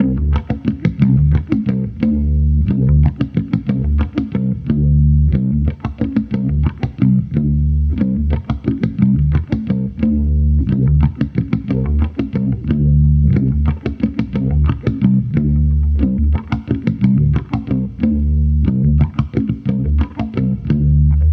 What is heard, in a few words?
Amped loop 90 D, Electric Bass Loops; Slate Digital BASSHOLE Sample Pack